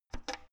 oven knob turn soft squeaky

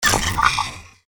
kybernetic link

